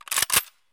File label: AR Equip